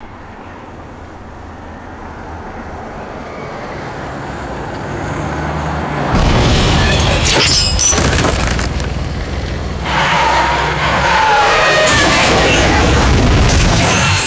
heavy vehicle crash with metal slide and debris; destruction

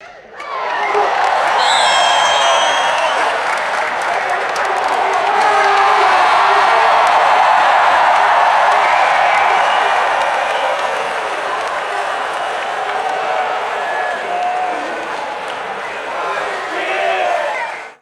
large crowd cheering and whistling

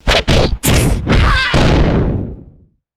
cartoonish punch impact with airy tail

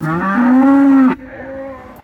single resonant cow moo; animals

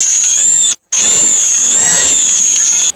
small electric toy motor revving

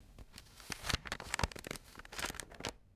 sharp dry book spine crackling

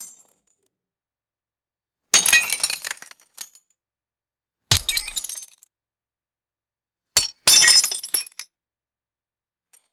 thick ceramic debris breaking and scattering; destruction

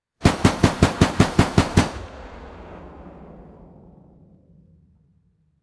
rapid anti aircraft cannon burst; guns

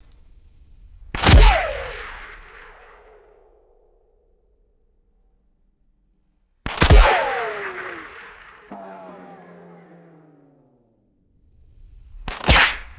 sniper rifle shots and ricochets; bullets

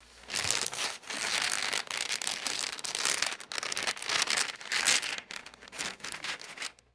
crinkling plastic bag being removed

